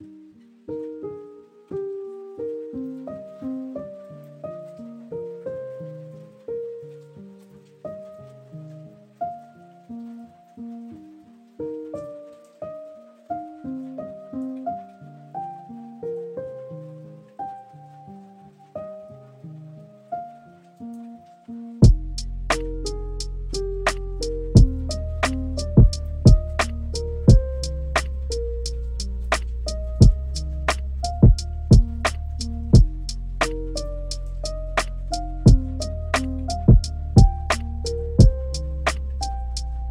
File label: Rainy Lofi Piano Beat